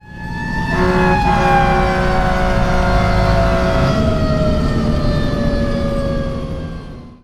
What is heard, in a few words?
sirens and horns